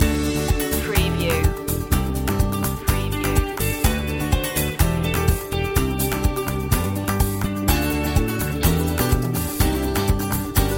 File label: bright journey loop